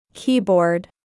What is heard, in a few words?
Keyboard